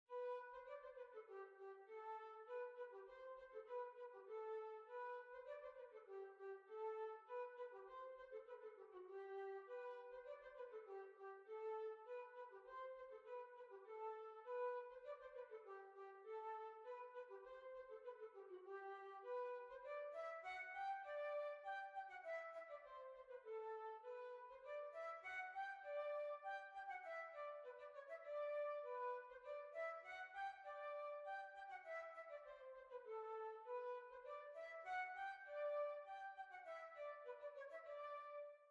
German Dance

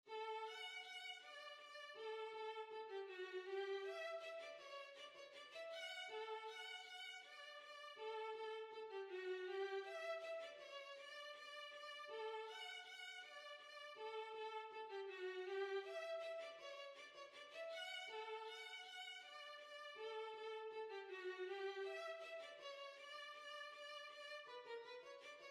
Polka, Violin; Alborg Polka

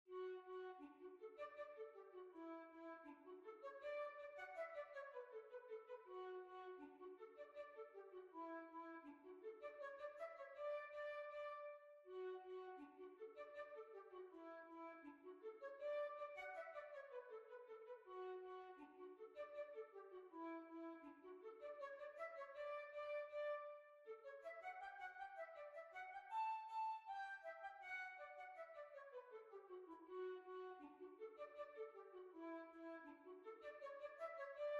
Jig

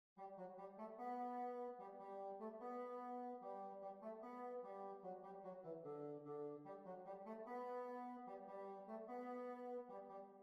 Grand Hornpipe

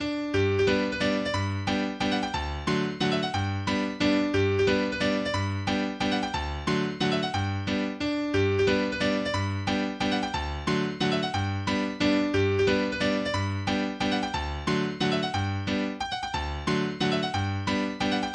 Tyrol Waltz, english dance tunes; eighty english dance tunes

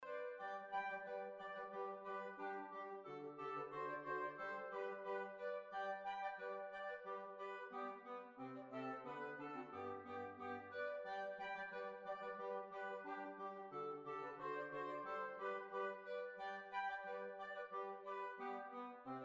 Hornpipe; Steam Packet Hornpipe

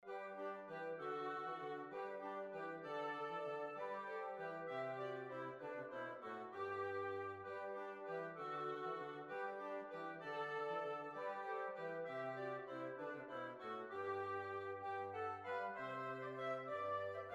Waltz

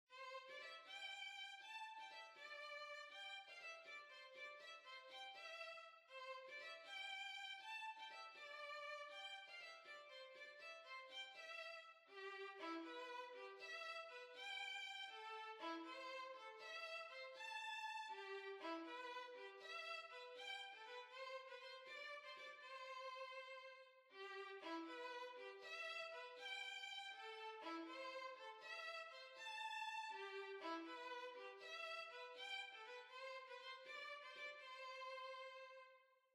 Violin

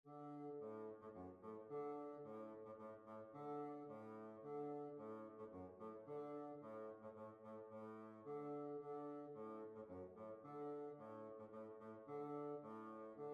Heel and Toe Polka

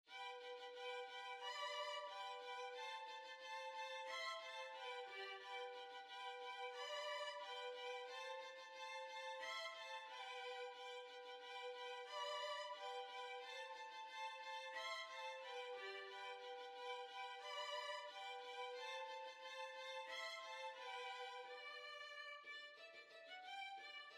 Violin

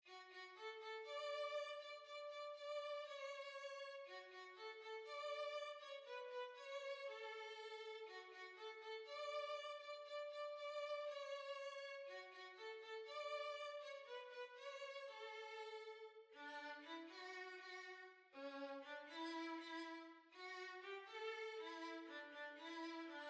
Violin

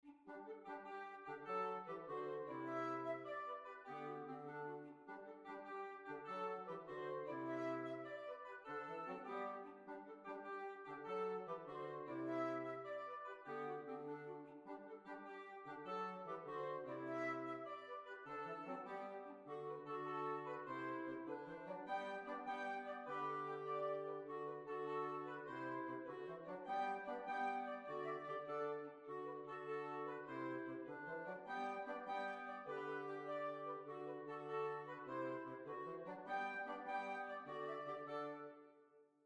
Jig

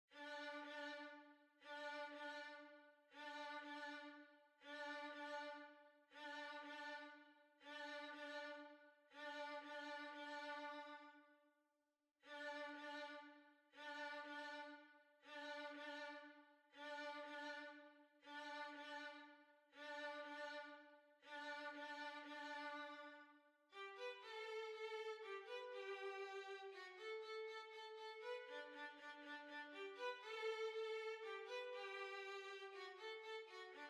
Waltz, Violin

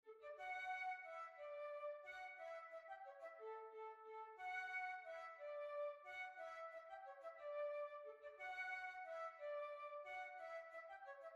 Swiss Waltz; Swiss Waltz II

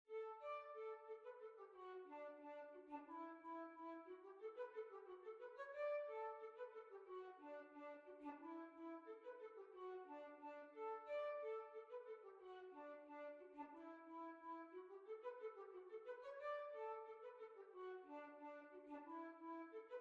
Lancashire Hornpipe

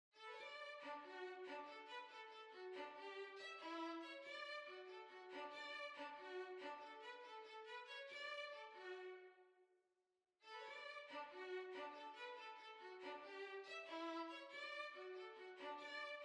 Quadrill, Violin

